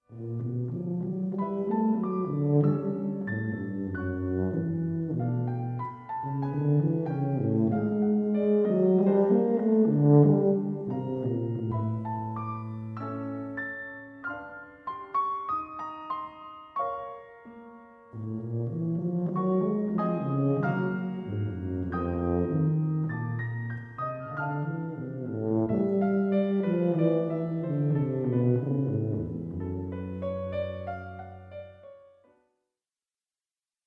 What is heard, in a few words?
sonata for tuba and piano